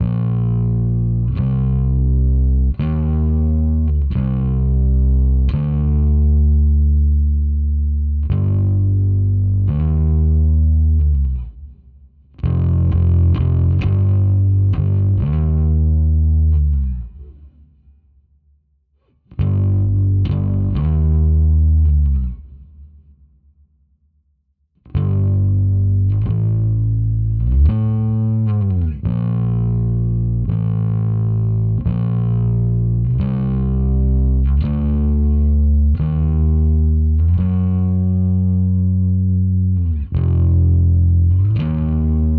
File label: Layer Bass; The Binding of Isaac Repentance; Genesis II Reverse Caves Layer (Bass)